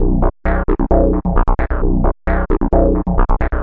132 cyber low arpeggio synth, bass loops

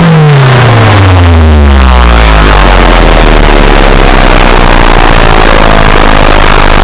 destroy speakers subtone; Various Strange Sounds and Effects

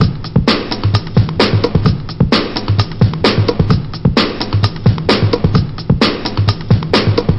130 bpm, finger roller, drum loops and breaks

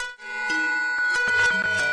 124 offbeat harmonic rhythm; SYNTH AND ELECTRONIC LOOPS

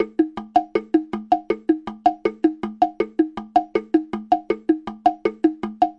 160 bpm latin bing bong tick tock; 141 to 160 bpm

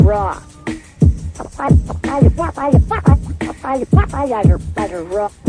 088 bpm rockbeat rock scratching

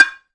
tsuzumi, Japanese Drums; ETHNIC and WORLD PERCUSSION

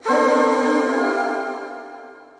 Layered Vox, female layered synth vox; VOCAL

